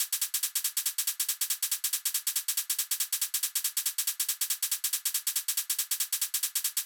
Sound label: drum Loop 140BPM HH